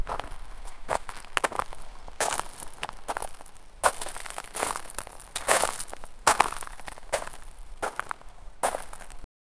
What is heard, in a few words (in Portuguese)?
passos sobre cascalho em, cave